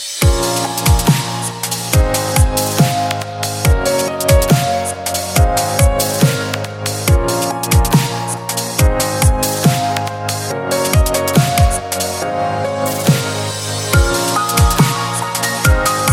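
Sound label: beautiful SMS; krasivyy rington sms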